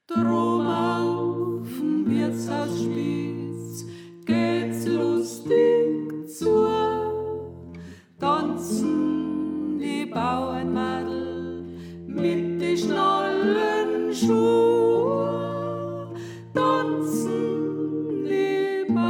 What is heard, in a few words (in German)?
Sopran; Drobn Auf n Wirtshausspitz